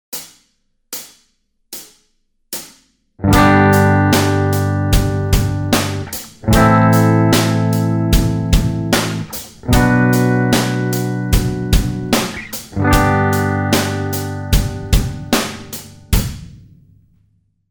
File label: barre chords simple progression